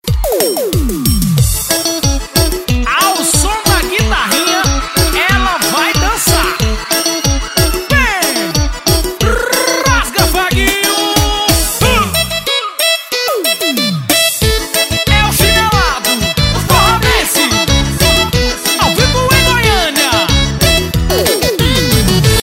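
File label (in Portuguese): forró xinelado ao vivo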